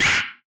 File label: weapon malfunction